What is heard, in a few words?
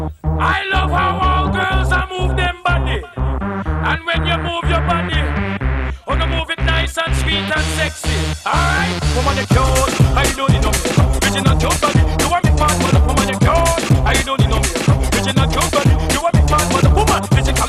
music